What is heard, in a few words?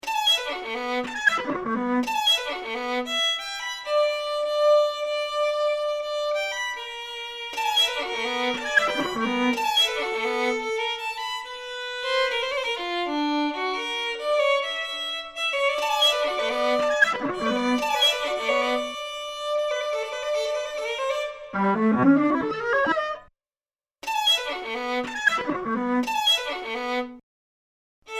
violin essay; piano and electronics; Larghetto, for piano and electronics